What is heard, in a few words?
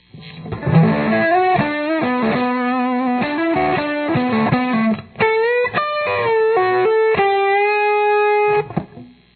solo